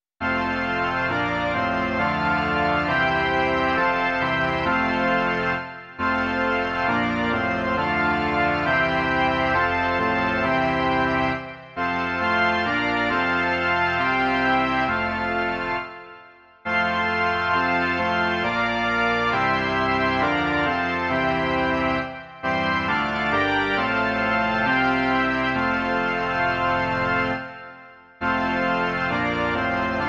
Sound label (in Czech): varhany; Ó město Boží, ty jsi štít